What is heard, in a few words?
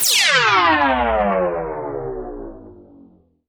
Sweeps Down; Vengeance - Essential Clubsounds Vol.4; Effects